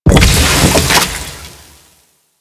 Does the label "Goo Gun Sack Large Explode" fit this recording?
Yes